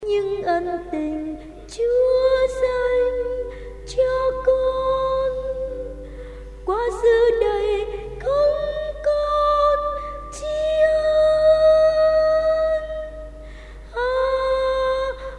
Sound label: tenor basso